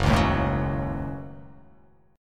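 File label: Gb Minor 6th Gb, 8 string; strummed; JGuitar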